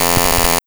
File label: Rhythm; 99Sounds; Mangling Audio; Data Mosh Loops; More Rhytmic